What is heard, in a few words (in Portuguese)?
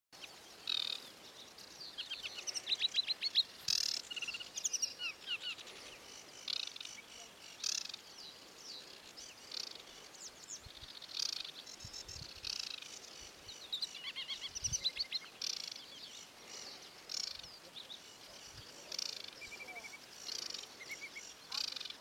canto da felosa aquatica